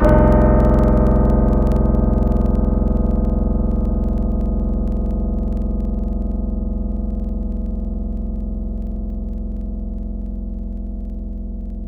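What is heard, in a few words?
keyboard PIano Low, Wavetables; Synthetic's Serum Collection; Standalone-Music SYMPHONY Wavetables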